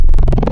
Monster; Synthetic's Serum Collection

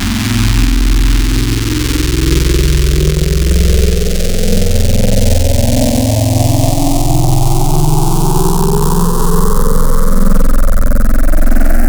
Drone Pad; Wavetables; Synthetic's Serum Collection; Cymatics - Essentials Wavetables

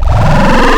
Squelchy FM, Spectral; Synthetic's Serum Collection

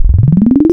Formant; Synthetic's Serum Collection; HYPERBITS Core Tables

